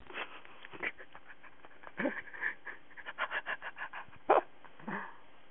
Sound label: laugh haha